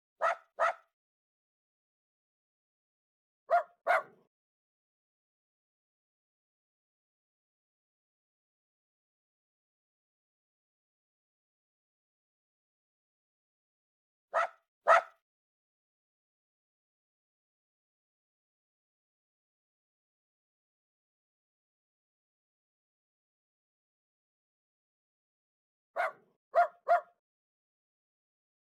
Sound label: Dogsong Barks; unsighted